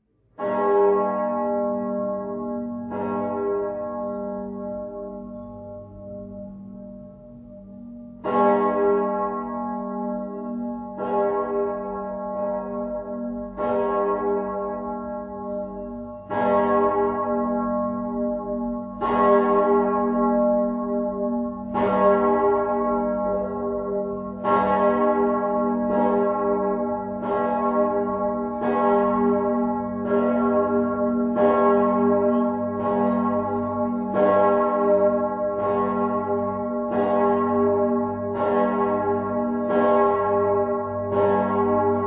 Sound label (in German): Glocke gis, Domglocken; Bernardus